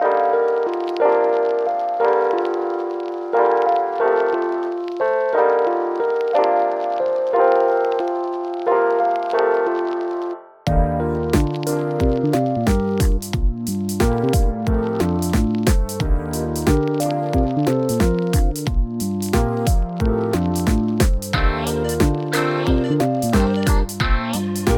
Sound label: KK Robot Synth Remix; nintendo lo-fi megamix 2023; ft. Eleanor Forte; Animal Crossing - New Horizons